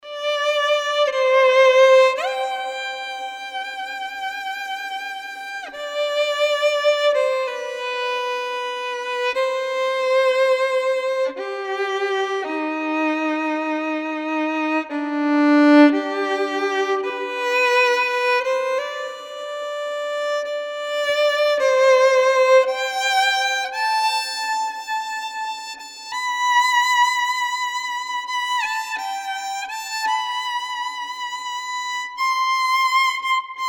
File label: Somber Ensemble Violin solo